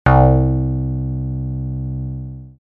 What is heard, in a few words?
Triton, synth lead